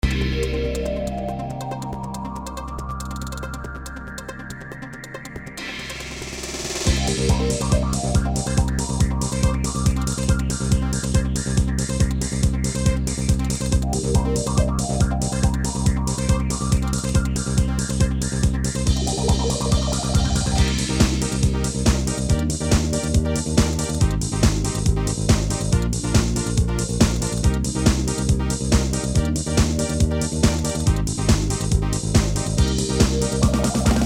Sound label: dance